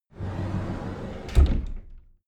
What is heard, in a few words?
cupboard slide door open regular